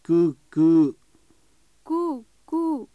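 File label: peacock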